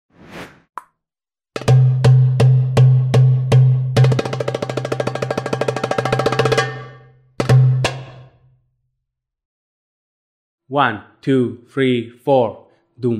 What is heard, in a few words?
dabke; la musica arab tradicional; Musica Magrib; ritmes